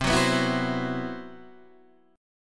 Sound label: C Major 7th Flat 5th C, Guitar Open D